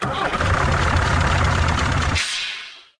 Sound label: Tractor; Scrolling Tractor; lego my style preschool